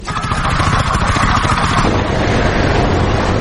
carwash

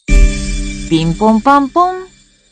ping pong pang pong

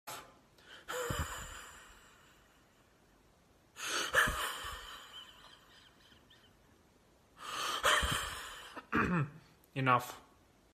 music

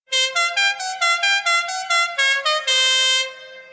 air horn; godfather

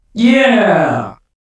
yeah crowd

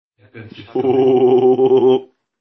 hohohoho

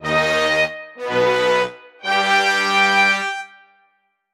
dun dun dunnnnnnn

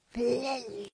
zombie

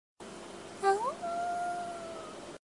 awoo

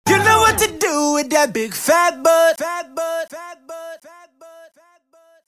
wiggle echo

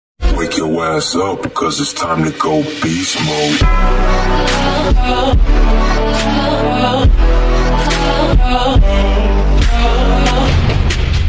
slowed reverb; its time to go beast mode; dodge hellcat

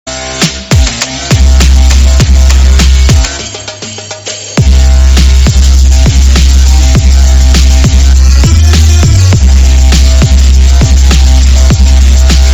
arabic trap

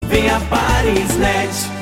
jingle

